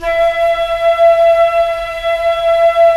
Flute, Roland LA Composer; Roland - LA Composer vol. 4; 90 s Sample CDs

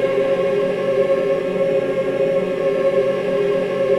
AVANTE G, Voices

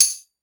Tmbrn, Roland SPD-S

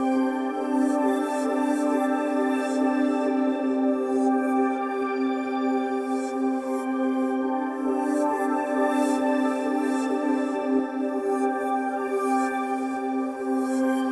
Dubwyzz Keys, Indie Rock; Sonic Foundry (Sony Creative Software); Indie Rock Anthems; Session 04 - Dubwyzz

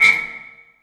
Oneshot

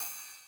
Ride Max Decay, 909

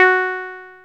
ACID F#4, Dance; Best Service Alpha Dance vol.2

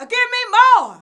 VOX VOX; Sound & Vision - Gigapack II CD 2

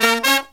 LOW HIT, Brass

